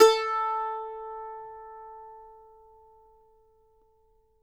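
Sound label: Mandolin, Country; Sampleheads; Whole Lotta Country 1 & 2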